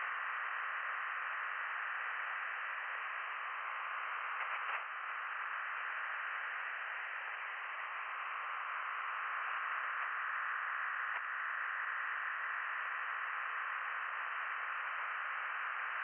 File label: from radio, 7177KHz